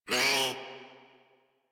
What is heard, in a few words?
Kavat Vocal Pain; Warframe; Khora